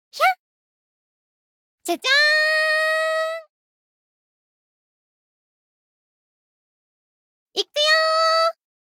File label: Big Win Surprise; magic maid cafe